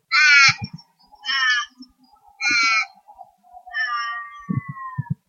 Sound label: caiguna crow